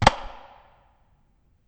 shoot

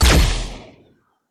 Blaster Shot; FPS; Weapons; FirstMicroGame

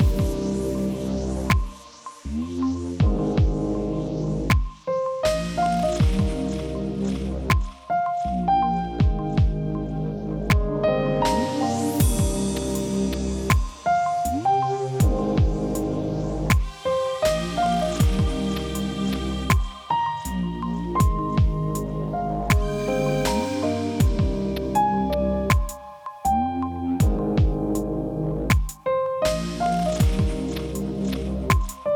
Stream Loops

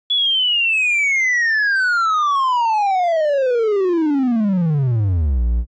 long game over

